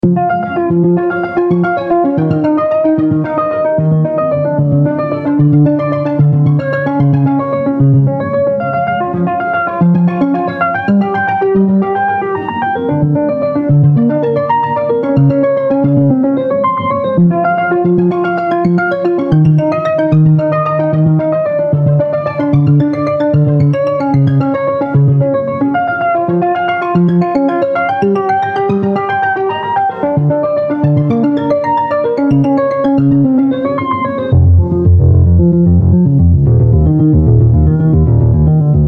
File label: (112 BPM)